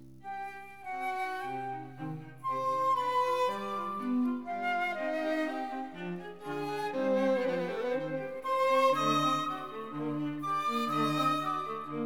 Quartet for flute, violin, viola & cello in C major; K. Anh. 171 (K. 285b); Mozart; The Flute Quartets